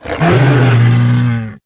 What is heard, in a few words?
Tric Call, Dino